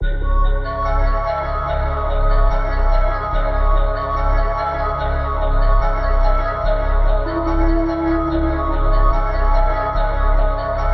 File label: 145 BPM C# Min, Melody, Trap; Cymatics; 2022 Melody Collection; Trap Pt 1